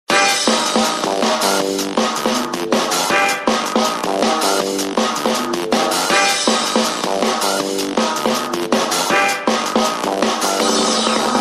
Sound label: Delusion, ringtone; Samsung